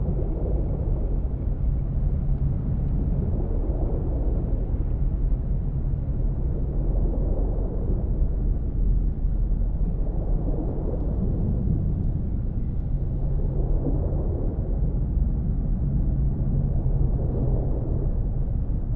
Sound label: cruiser idle; aquatics